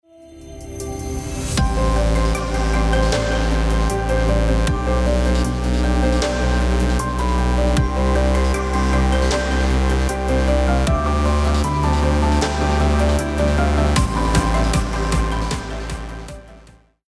Future Bass Bass Non Filter

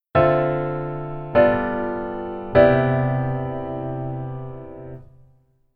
Keyboard Voicing Hi

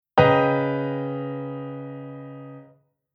Chord Hybrid; Jissen

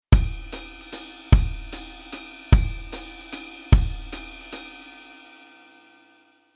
Rhythm Basic Velo Kick

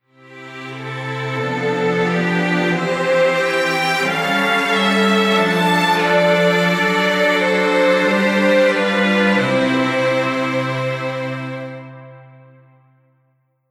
Strings

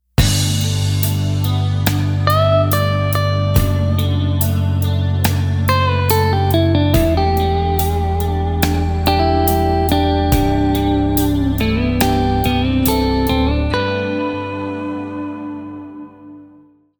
guitar pattern

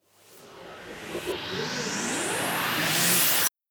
Synth SE Rise

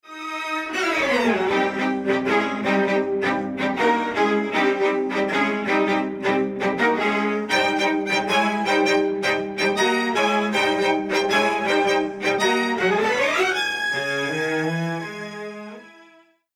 Strings Kizami Strings Only

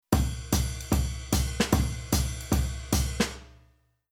Rhythm Swing Jazz

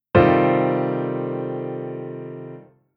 Chord Poly; Jissen

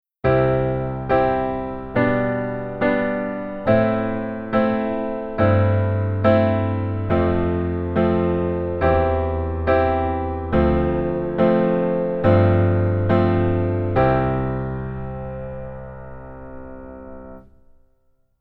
Keyboard Chord

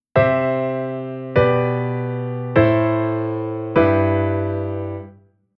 Chord Inversion C G Am Em

